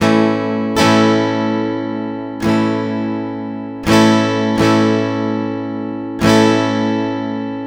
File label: One Shot Guitar - 125 BPM (A Major), Acoustic Guitar; Instruments; One Shots; GhostHack; Ghosthack - Free Guitar And Piano Samples